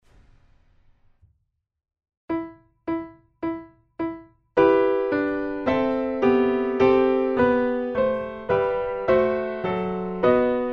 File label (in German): Adagio Klavier; Sonate E-Moll; Haendel; Hallenser Nr2